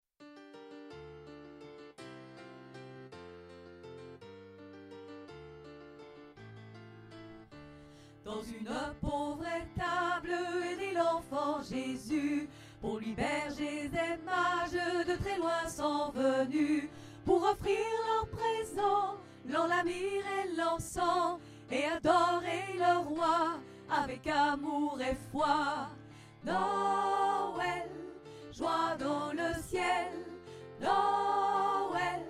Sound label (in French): Noel joie dans le ciel Altos